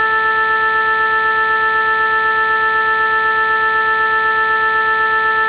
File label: oboe